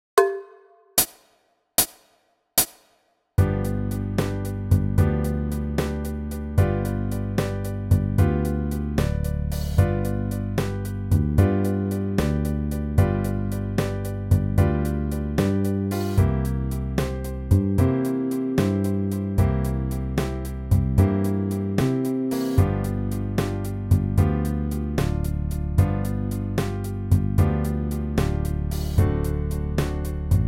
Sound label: Blues Simple